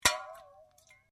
Bottle Metal Liquid Impact Ring, Metal drink bottle being struck; Materials